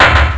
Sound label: light clunk; svencoop